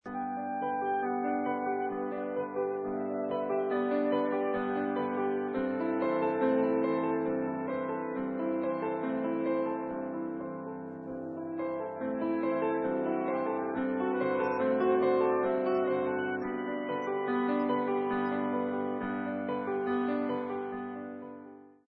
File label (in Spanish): El cisne; repertorio para bodas